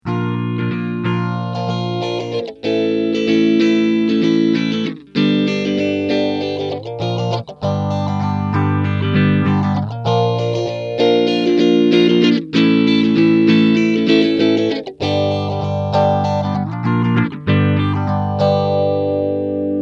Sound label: phase; Rate 0%, Recycle 50%